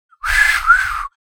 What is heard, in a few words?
Human failed whistle